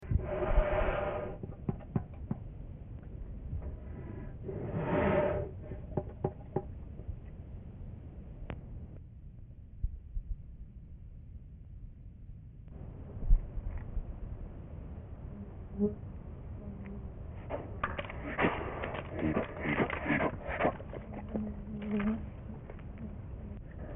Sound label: huffing, bear sounds